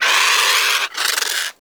Vocal Alerted; Characters; Warframe; Hunting Critters; Avichaea

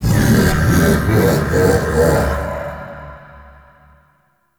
Laugh; Warframe; Entrati Doppelganger

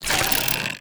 Bow Draw; Grineer; Weapons

